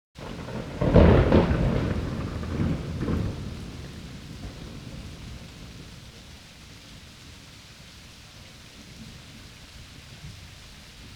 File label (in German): donner starker einschlag; geraeusche digital